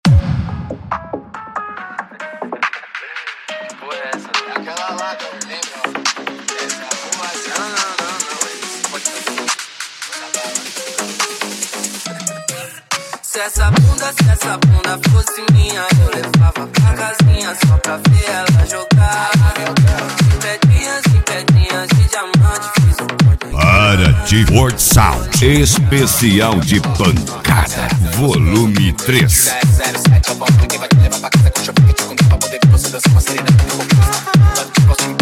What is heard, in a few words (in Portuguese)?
esp pancada